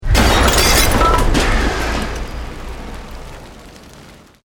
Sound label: Explosion Medium Brick Wood Glass